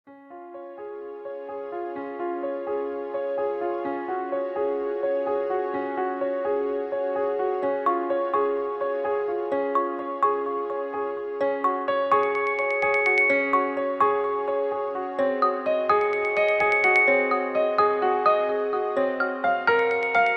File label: Awakening, alarms; Honor Pad X7